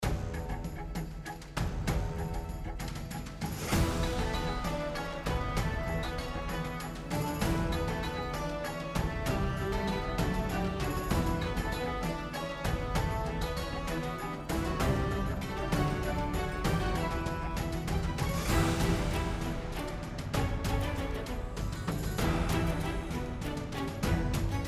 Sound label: Battle Ancient Galtian